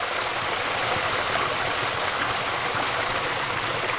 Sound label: Fountain; Mourn